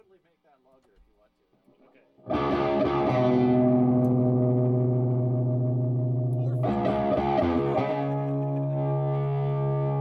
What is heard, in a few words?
Dialomatic riff slicer